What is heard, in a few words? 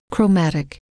chromatic